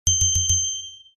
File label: multimedia alert finished taps dark with bright ringing